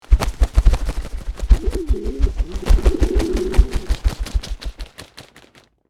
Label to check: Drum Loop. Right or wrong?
Wrong